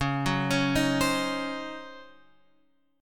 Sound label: C sharp Major 7th Suspended 2nd Suspended 4th, Guitar, Open F; C#M7sus2sus4; arpeggiated